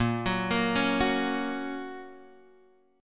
A sharp Minor Sharp 5th A sharp down Guitar Half Step Down